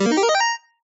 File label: Ball, Skee-Ball; TheStable; Pyralix